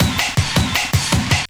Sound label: Drum & Bass, 160 BPM